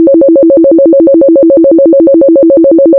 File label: square siren; Frequency Modulation; Audio Synthesis